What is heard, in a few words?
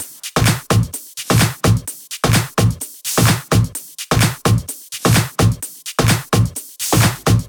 128BPM Brazil Kit; Vengeance Samples